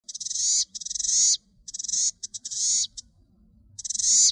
cisticola subruficapilla, birds calls; cisticolidae

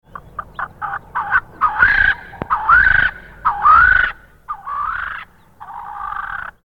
pternistis swainsonii, birds calls; phasianidae

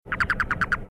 phoeniculus damarensis, birds calls; phoeniculidae

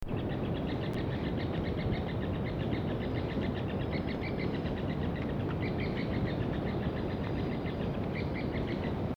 stactolaema leucotis, birds calls; lybiidae

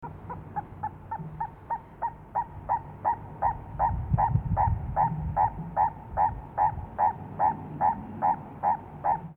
gallirex porphyreolophus, birds calls; musophagidae

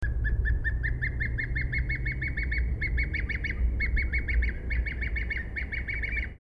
tockus bradfieldi, birds calls; bucerotidae

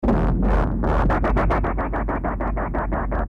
porzana pusilla, birds calls; rallidae

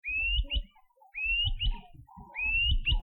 pachycoccyx audeberti; cuculidae; calls

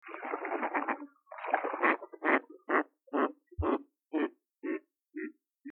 anas sparsa, birds calls; anatidae

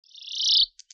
cisticola subruficapilla, birds calls; cisticolidae

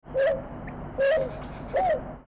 apaloderma narina, birds calls; trogonidae

